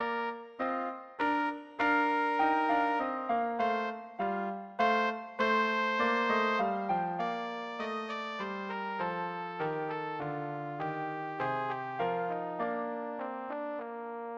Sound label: couperin petit rien, trumpet